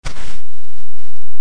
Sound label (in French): pas hom sable; Time Machine; Bruitages